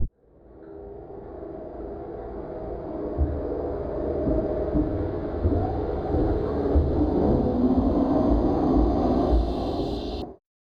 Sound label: Song Death Fade